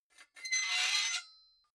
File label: sword blade screech high